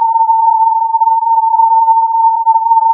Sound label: filtered input